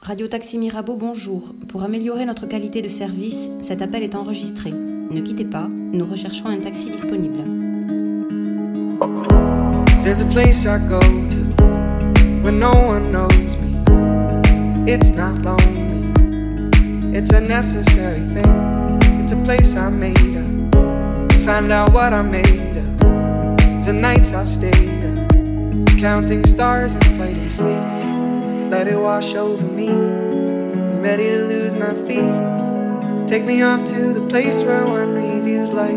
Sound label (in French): attente